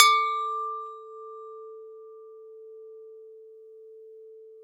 TUB AB4, tubular bell; SoundBytes - Early Patches

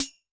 Snare, Yamaha DTXpress-II

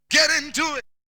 Vocal; ZERO G - Datafile DISC 1

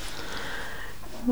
NOISE, CLASSIC VOX; Best Service; Hallelujah

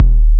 kick, Big Beat Samples; Big Beat Samples & More; AKAI

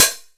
Bass Closed Hat, Jomox Airbase99

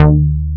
D3, J-106 BS; Drums In Your FACE!

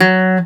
CG SOFT F2, C. GTR SOFT, Celtic Flavours; USB Soundscan vol.42; AKAI

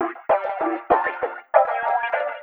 Metafunk, Leads And Riffs; Sonic Foundry (Sony Creative Software); Synchro Funk

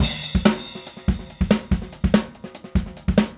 Straight Kit, World Pop Beats

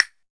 CASTINET

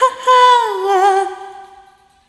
Rhythmic G

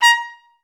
TRS2STAC A4, Advanced Orchestra; Best Service

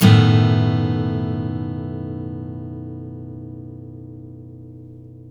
Blues Guitar, BARI CHORDS; ILIO; Fretworks

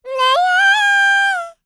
LICKS, Vocal Planet Jazz FX; Spectrasonics

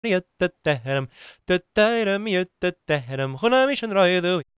GAELIC, World Voices; ILIO; Vocal Planet

